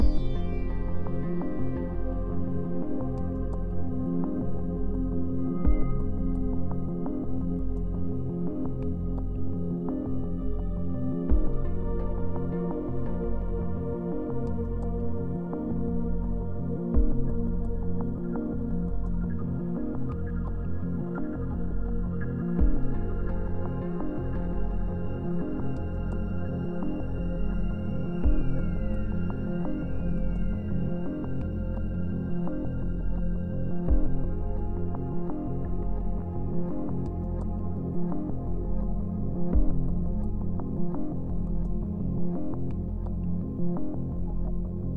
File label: Ambience 85BPM; Flytrap